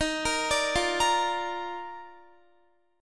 Eb Major 7th Suspended 2nd Eb Guitar 4E 4A 5D 5G 5C 6F; EbM7sus2; arpeggiated; JGuitar